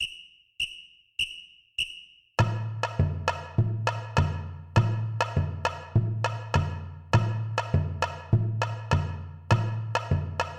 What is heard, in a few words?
reggae tams